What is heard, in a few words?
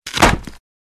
Wood creaking and collapsing